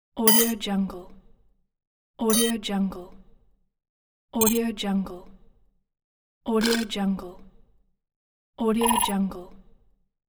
control room touch panel fx pack

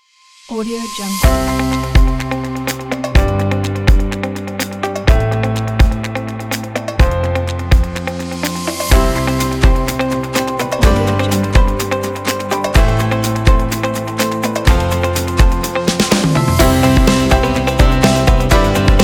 upbeat corporate background

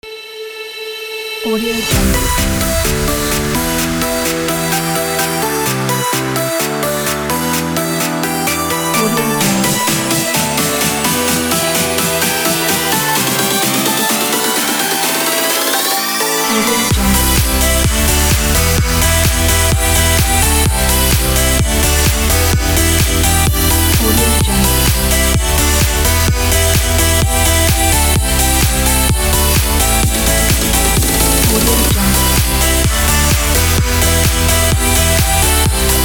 happy

